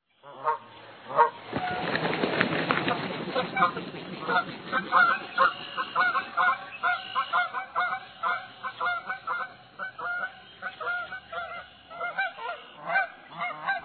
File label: Canada Goose, bird calls; Envirothon Jr. High Resources; Jr. High Enviro Wildlife Resource Packet